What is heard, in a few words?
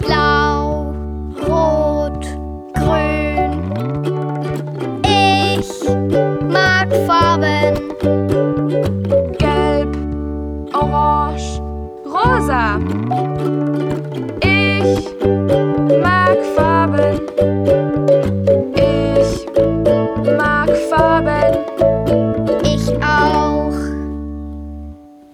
song german